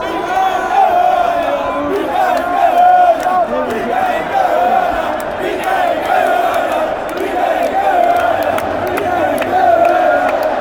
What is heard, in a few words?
chant, fanchants; randers